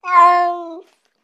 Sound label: Cat Domestic Meow Sad